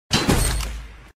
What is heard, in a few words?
headshot; fortnite